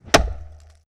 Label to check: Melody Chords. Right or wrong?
Wrong